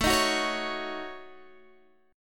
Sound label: A 6th Flat 5th, Guitar Open G, chord